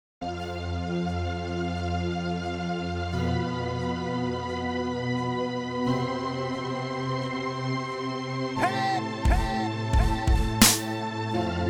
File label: original instrumental; Dreaming